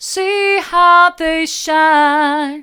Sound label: mid, vocal